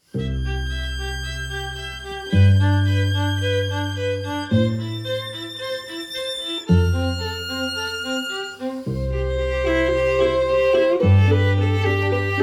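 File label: quartet, String Ensemble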